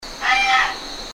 muntjac; Formosan Muntjac, Muntiacus reevesi; call; Bird Call Recordings

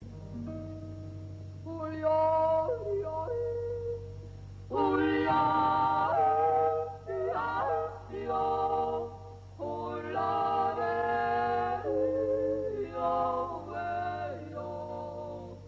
yodel

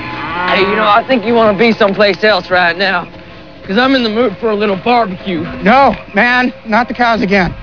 cows; bar-b-q time!

